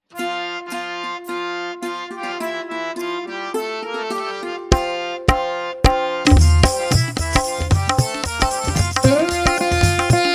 Gents Karaoke F Pancham

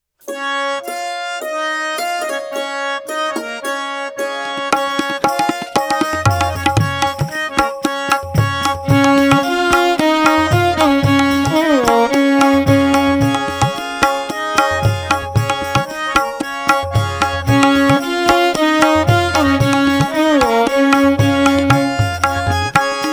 Ladies Karaoke C Sharp Pancham

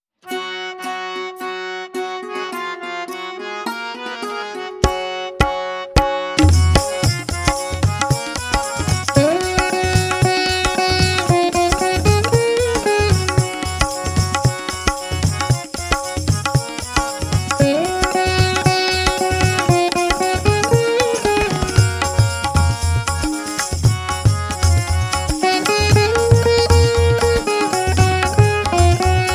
Gents Karaoke F Sharp Pancham

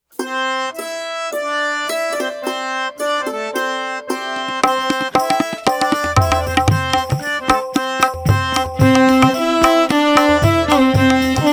Ladies Karaoke C Pancham

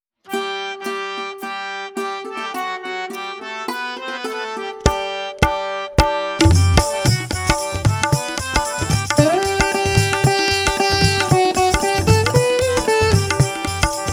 Gents Karaoke G Pancham

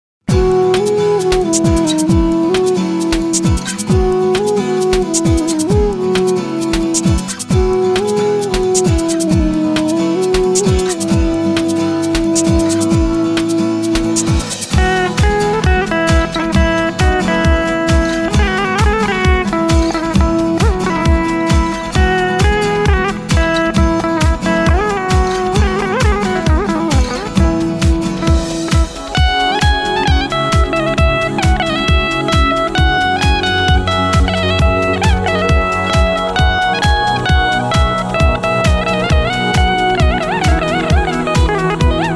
Mandolin; 90th Birthday Theme

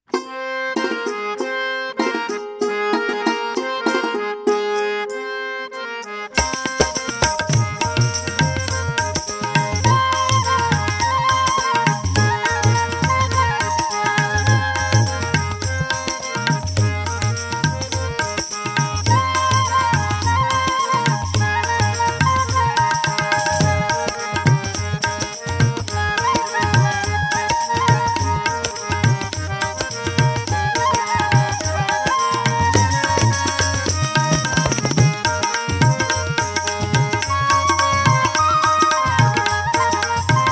Gents Karaoke G Sharp Pancham

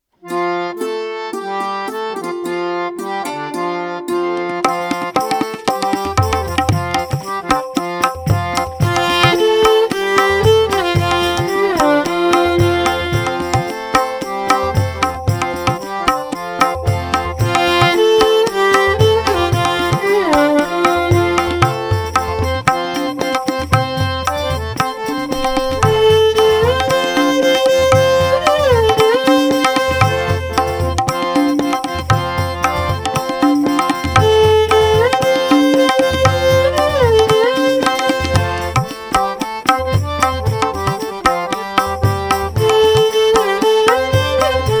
Gents Karaoke F Pancham

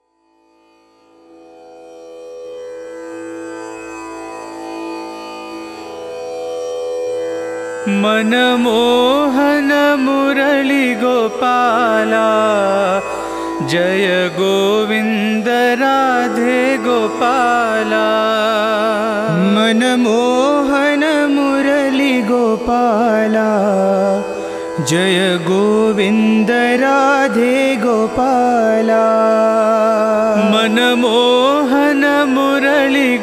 tune; mana mohana murali gopala

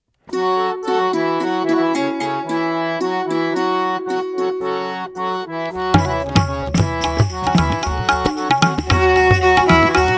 Gents Karaoke F Sharp Pancham

